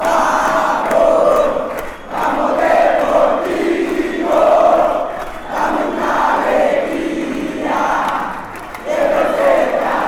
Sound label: chant, fanchants; deportivo cali